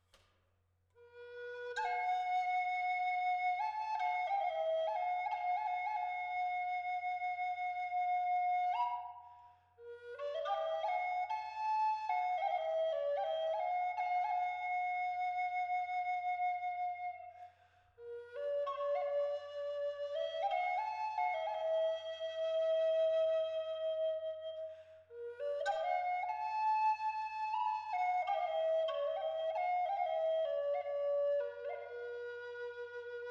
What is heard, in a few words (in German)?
Walnuß B 432 Hz